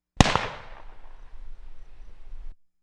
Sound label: enfield; WW2 Era Rifles; British Enfield No.4 Mk 1; 45 meters; Vigesaa Productions